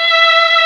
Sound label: MELLOTRON; East West - Global Sounds